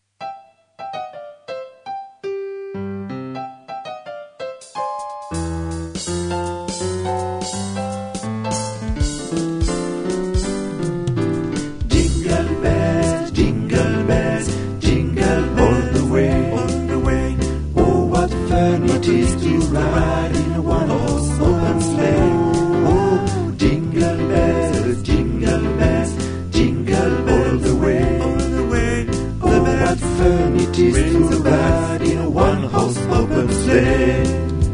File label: jingle bells tutti